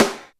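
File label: TAP SNARE; East West - Phat & Phunky CD2; 90's Sample CDs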